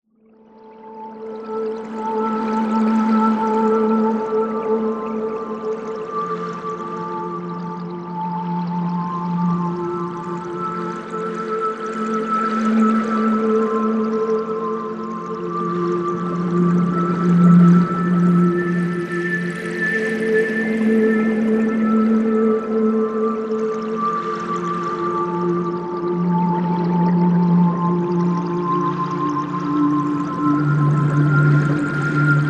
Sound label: Whistle Flow